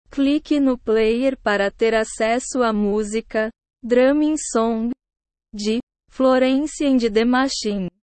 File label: drumming song